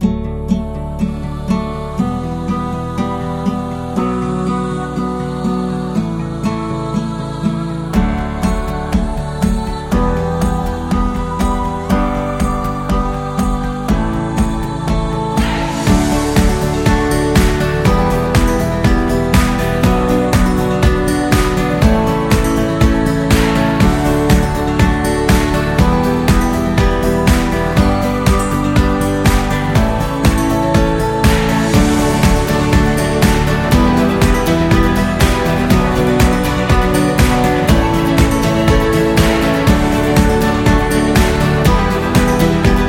Guitar Acoustic Folk Upbeat

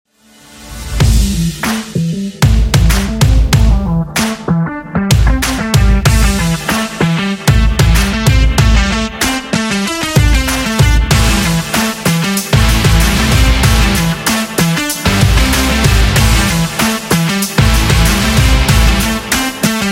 Rock Promo

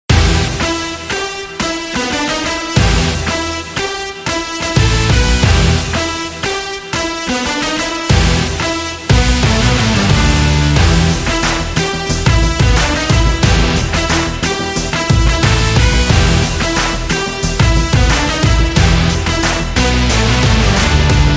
Energetic Sport Action Rock

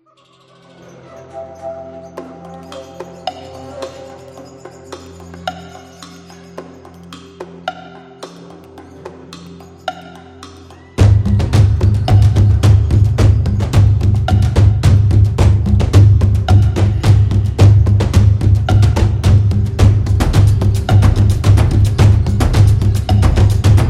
African Music

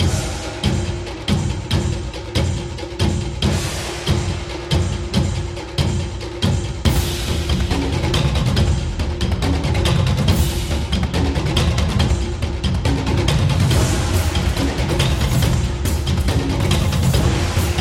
Japan Drum

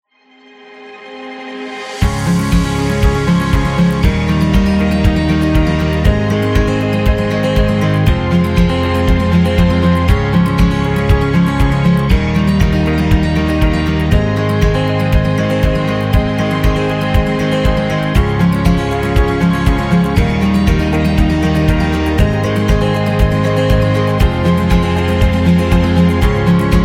Romantic Wedding

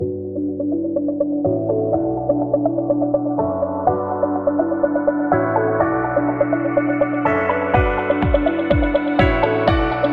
Corporate Promo; corporate background

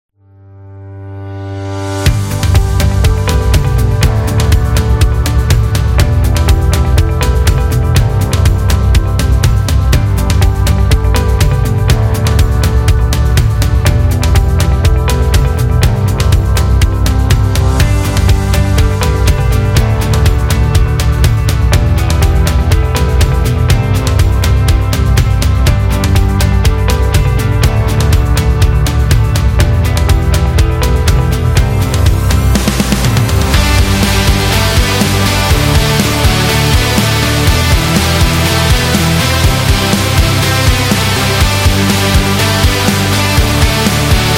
Fitness Music, metal

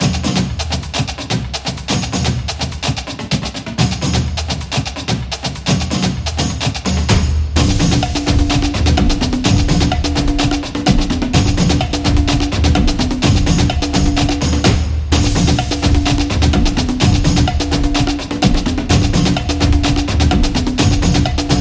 Samba Drums

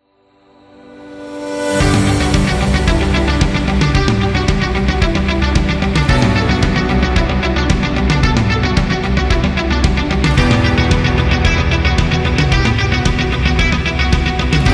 Corporate Rock; friendly

